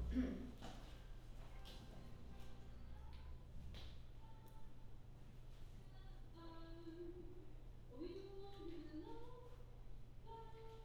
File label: Choir L